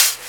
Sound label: CABASSA, Ragga & Dub Reggae